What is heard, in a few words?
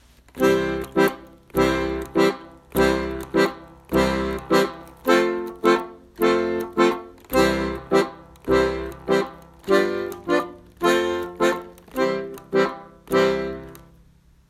blues basic